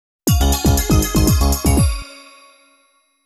Jingle; Fate Tiger Colosseum Original Sound Track [Complete Edition]

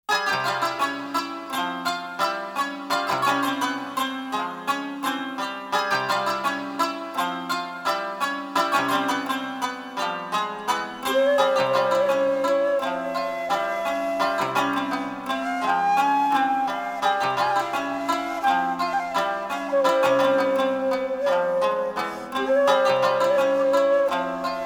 off percussion ver.; Fate Stay Night Soundtrack Collection; Fate Stay Night Original Soundtracks

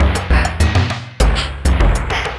Drums; Hylics

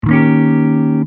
down; Hylics

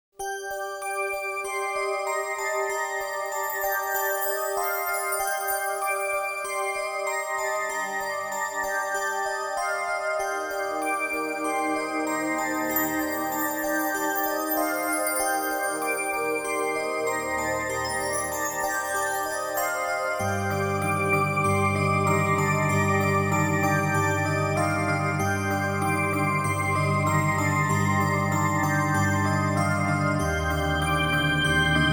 off strings ver.; Fate Stay Night Soundtrack Collection; Fate Stay Night Original Soundtracks

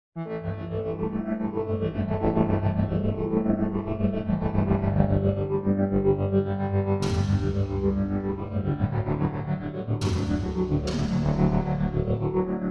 New Age; Hylics

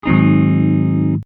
GS7 up; Hylics

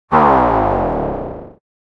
Death sound; Hylics

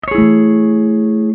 Amaj7 up; Hylics

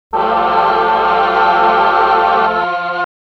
Sing; Hylics

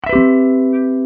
Cmaj7 up; Hylics

